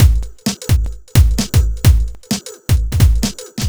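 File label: No Percussion, Drum Loops 130bpm; Zero-G; Soundclash Nu Dance Electro; Acidized Wavs; Dub Me Live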